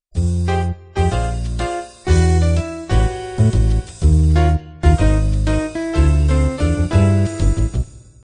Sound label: jazz quintet intro